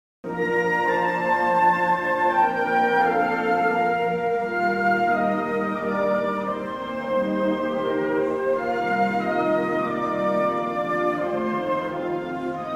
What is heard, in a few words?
consert, flute; arietta-flute